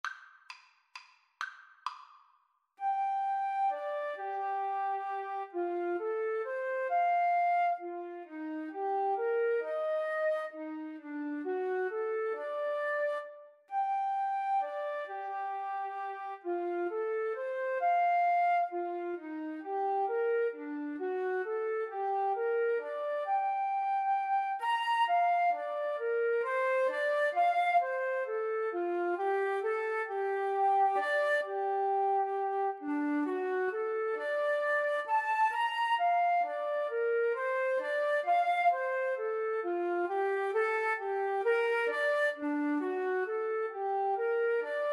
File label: flute duet